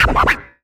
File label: Yamaha CS1x Drums